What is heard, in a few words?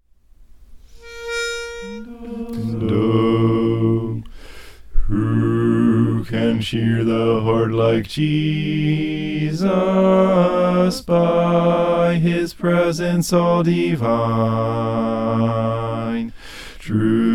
bass